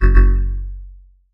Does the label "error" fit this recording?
Yes